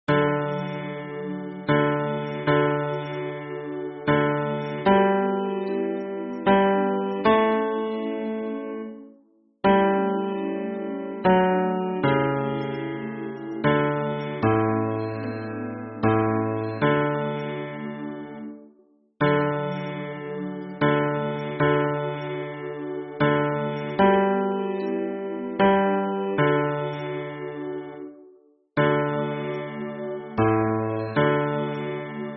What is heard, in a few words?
hymn bass